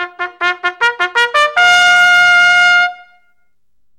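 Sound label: bugle reveille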